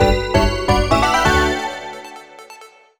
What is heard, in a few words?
Home Menu Banner; Club Nintendo Picross; 3DS